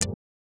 Percussive Elements

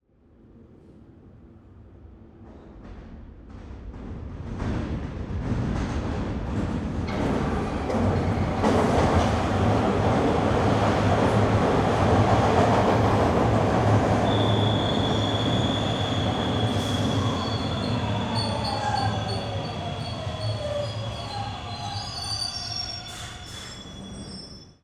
Subway, Toronto, By, Stop, Squeal

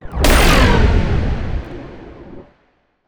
Vehicle Blast Off, Sci-fi; David Dumais Audio; Sci-fi Flyby Sfx Pack

